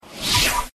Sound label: skill bullet; painter